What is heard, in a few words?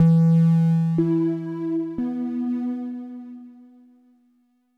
ECHO E2, SPACE ECHO